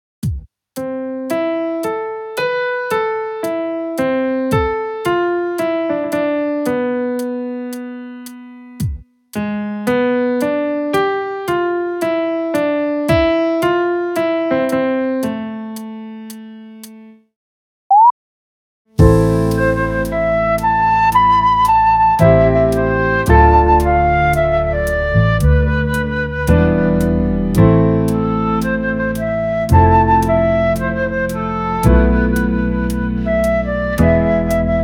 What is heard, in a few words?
Flute